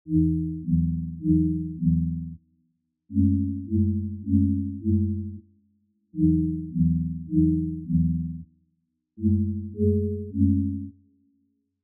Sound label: Chords